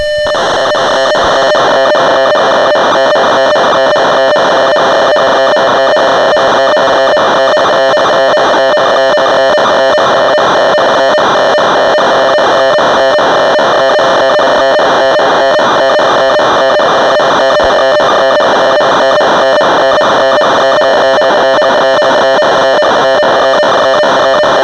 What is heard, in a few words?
Rhodes Polaris factory presets, Cassette Interface; Synthesizer Factory Patches